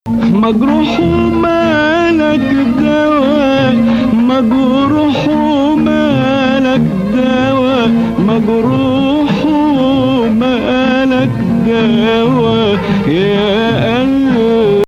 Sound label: Bayati Nahawand, maqam